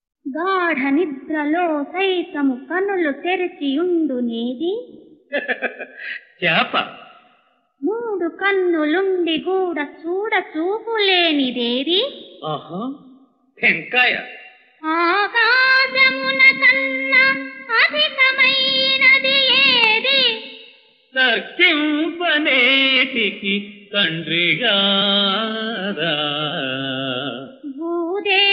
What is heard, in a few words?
padyam, telugu; kambhojaraju katha